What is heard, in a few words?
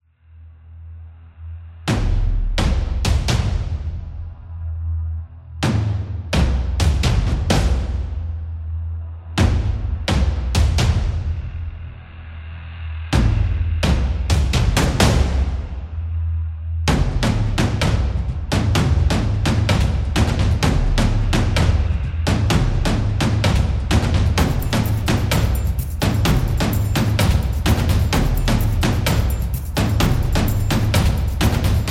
War Drums